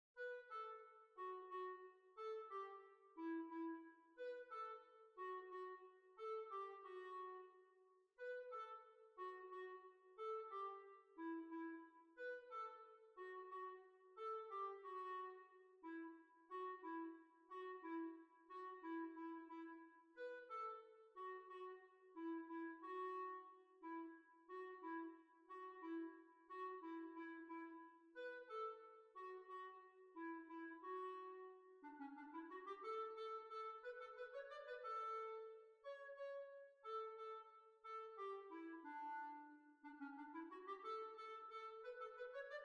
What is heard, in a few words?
Swiss Waltz; Swiss Waltz II; B flat